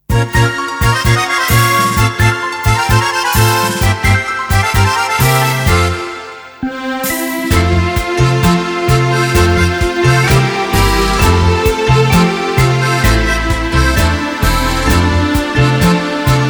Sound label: Tango Medley